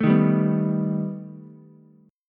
B Augmented 7th B down Ukulele; strummed; JGuitar

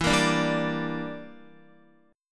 E Minor 7th Flat 5th E, Guitar; Em7b5 strummed

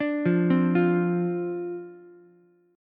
D-Minor 7th, D, Ukulele; Dm7; arpeggiated

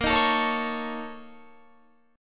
Bb Minor Major 7th Double Flat 5th, Guitar; strummed; 4E,4A,5D,5G,5C,6F

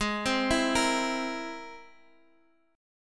C Augmented 7th G sharp

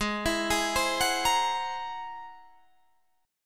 G sharp Augmented 9th G sharp Guitar Open D

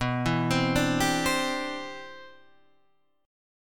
C Major Add 9th, B, guitar chord

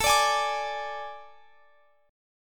A Major 7th Suspended 4th A, Guitar, Standard; AM7sus4; x,x,19,19,21,22; strummed; capo=2

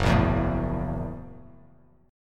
F sharp 7th Sharp 9th, chord; strummed; 2,1,2,2; JGuitar

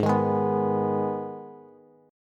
Ab Minor Ab down Bass; Abm strummed; JGuitar

